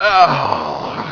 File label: DYING; BattleSNDS